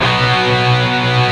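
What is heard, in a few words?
synth guitar; E-MU Formula 4000 Series Vol. 5; Protozoa; Orbit Instrmt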